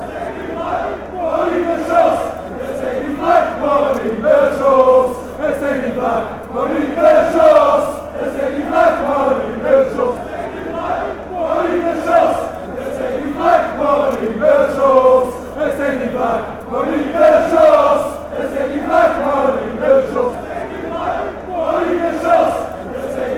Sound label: beerschot chant